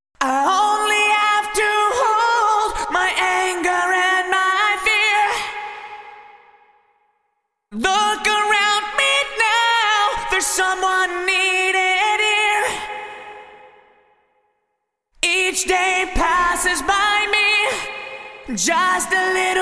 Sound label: Vocals Yamaha SPX2000 Rev X Large Hall Vintage Digital